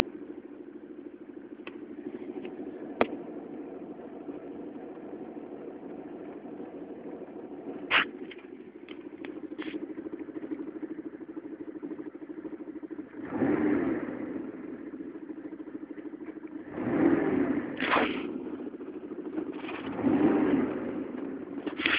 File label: ticking sound